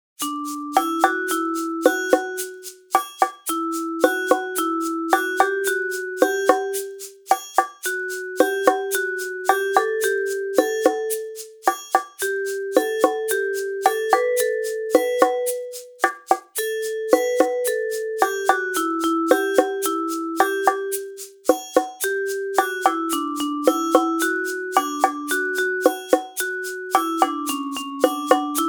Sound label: Percussion Ensemble; Unit 8 Pumpkin Cupcakes